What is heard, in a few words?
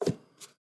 VHS Handling; SoundsCrate